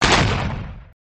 Tank Firing

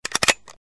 Gun Cocking Fast; Mike Koenig

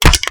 Button Press

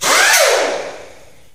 Air Wrench Short

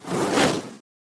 Flame Arrow

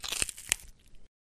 Rip Jaw Off

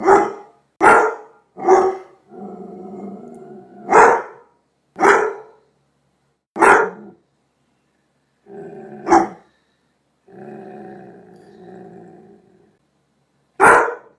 doberman pincher; daniel simion

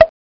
Pop Banner

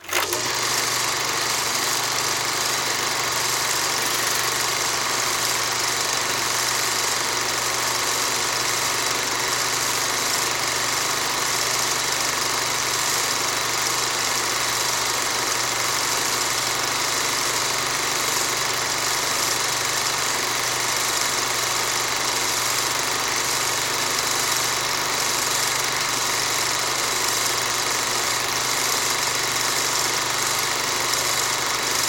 old movie projector; daniel simon

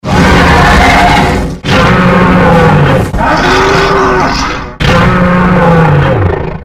Doberman; Monster Gigante

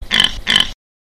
Double Snort

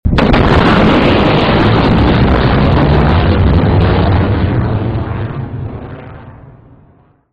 Sonic Boom Massive

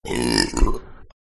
Deep Male Burp

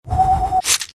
Thrown Shuriken; Cody Mahan

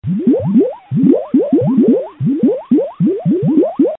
Bubbles

